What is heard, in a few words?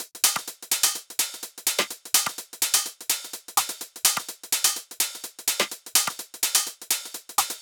Tech House 126bpm Top; EDM Drums; Drum Kits; Stems; Ghosthack; Ultimate EDM Drums; Tech House Kit 17